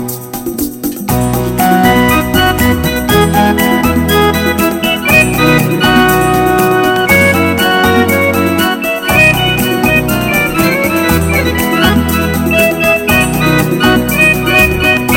Hot Unplugged Song

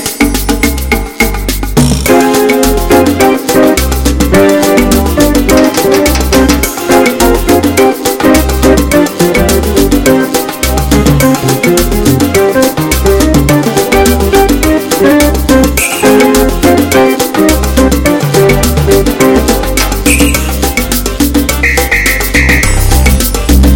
Hot Classic Mambo

